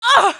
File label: girls, pain